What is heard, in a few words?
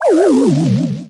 Balloon Death